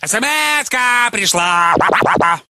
skretch sms

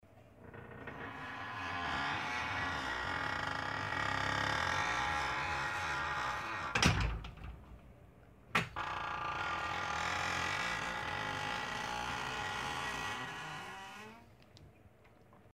skrip metall dveri; открывания и закрывания деревянных и металлических дверей; Сборник звуков открывания и закрывания деревянных и металлических дверей

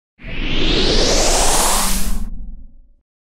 perehod kiberpank

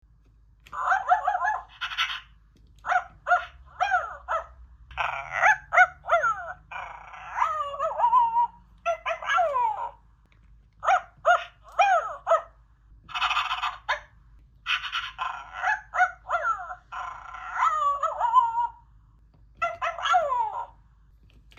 lai igrushechnoi sobaki

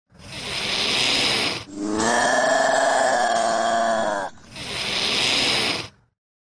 karakal

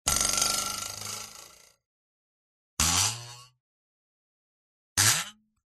prujyna

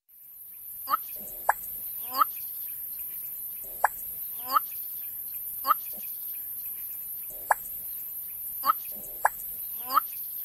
drofa aziatskaia

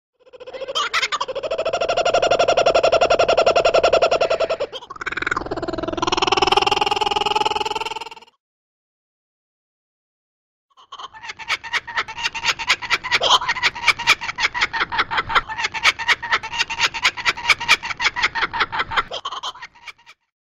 kukabara

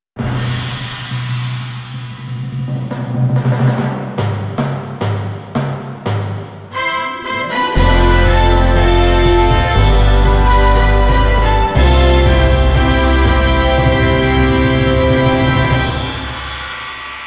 torjestvennyi zvuk nachala meropriyatiya

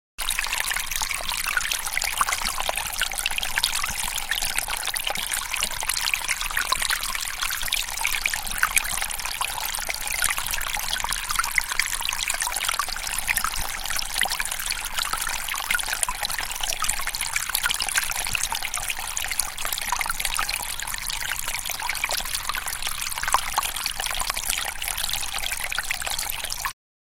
zvuk jurchaniya vody dlya mocheispuskaniya

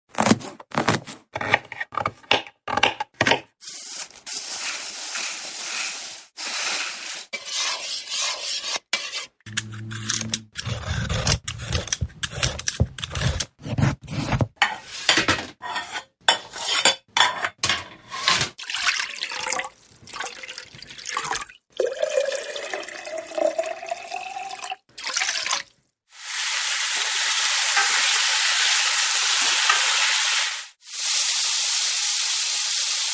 kuhnia

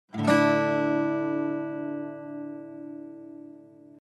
zvuchanie strun

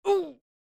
zvuk boli

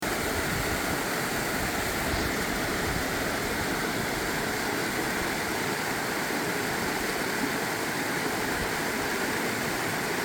gornyi ruchey; журчания ручья

